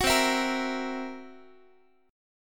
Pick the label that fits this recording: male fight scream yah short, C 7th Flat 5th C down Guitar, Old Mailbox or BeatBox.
C 7th Flat 5th C down Guitar